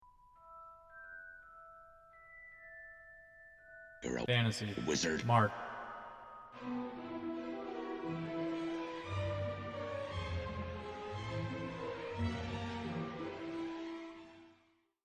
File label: fantasy wizard